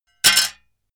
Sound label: metal plate thin drop